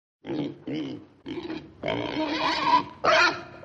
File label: Pig Oink